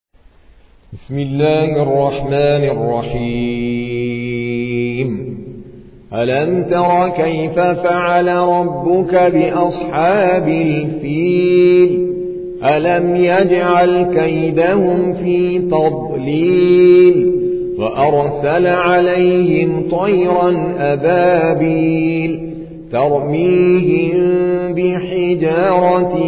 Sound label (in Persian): ترتیل قاری هندی; سوره فیل